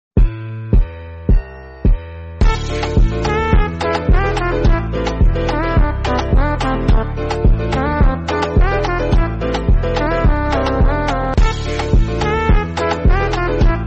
rat dance extralong

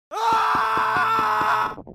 charge

